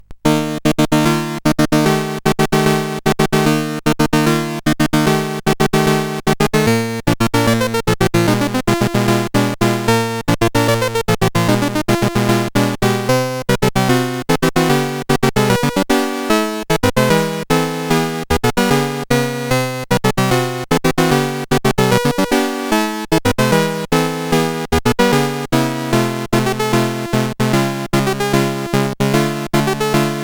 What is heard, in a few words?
Fanfare, CSG8580; MOS 8580; sid